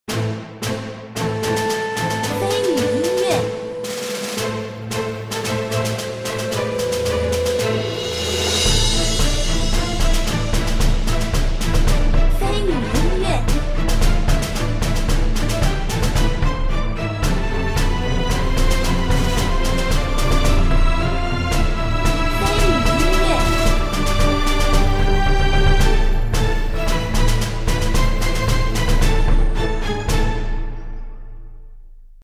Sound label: move